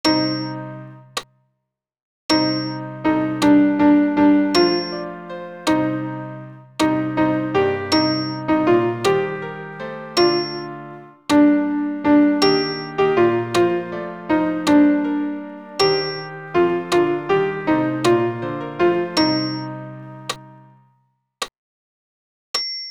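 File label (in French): Credo alto piano accompagnement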